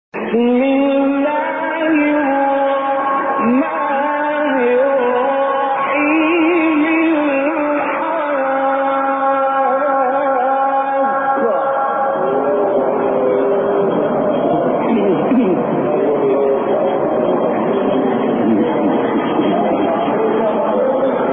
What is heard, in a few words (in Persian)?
فراز ماندگار از سوره; استاد غلوش; حاقه